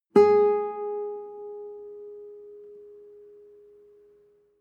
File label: nylon guitar single note high